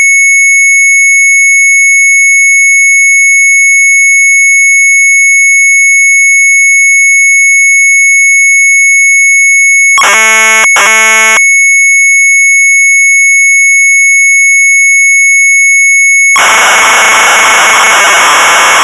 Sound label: Sharp MZ800 Tape